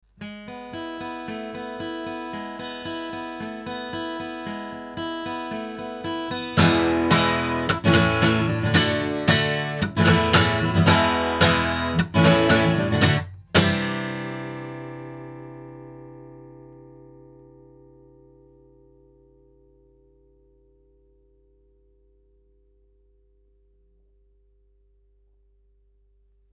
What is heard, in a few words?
BeyerDynamic MCE90 guitar, microphones